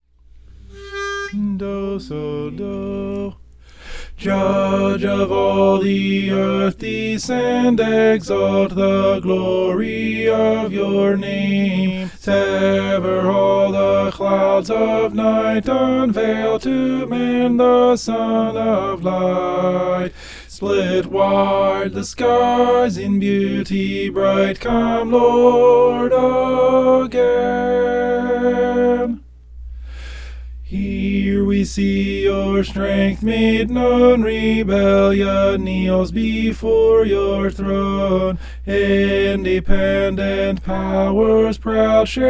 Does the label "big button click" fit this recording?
No